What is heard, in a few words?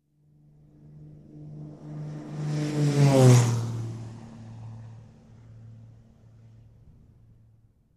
Fast propeller aircraft passing by